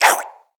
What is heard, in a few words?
Sticky Slurpy Steal